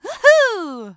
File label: hoohoo; pauline; SM64 CO-OP